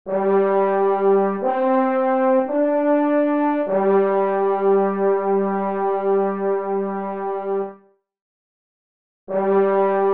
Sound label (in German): alphorn ruf